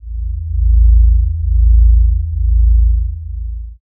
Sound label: space drone